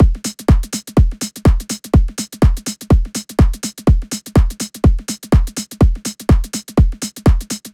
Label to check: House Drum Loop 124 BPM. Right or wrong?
Right